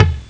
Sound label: bas b; ST-XX Amiga Soundtracker Sample Packs